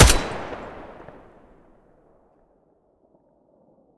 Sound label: ak12 muzzle mono, weapons; STALKER; IWP